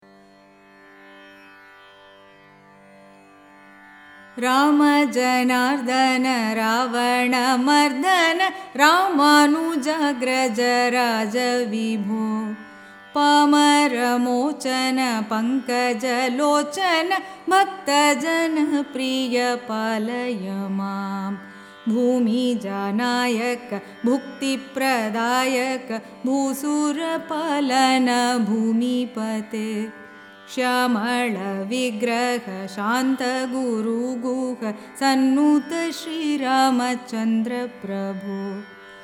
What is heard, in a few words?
song shankarabaranam adi tisra nadai, carnatic music; rama janardhana; dikshitar